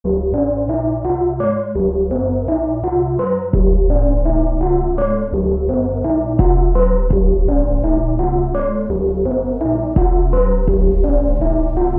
music match intro 160bpm; omori sound replacements

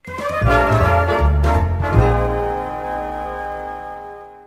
music stinger player respawn; Family Guy respawn

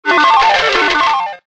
hook impact success; bebop; bebop lets go gambling hook

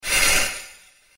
slide enter; Jet Set Radio movement sfx

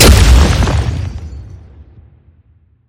charge wall impact; abrams; criminality soundpack

